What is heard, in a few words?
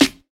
deep steel snare drum sound C sharp key